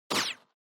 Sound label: Motion Agility Short; モーション・俊敏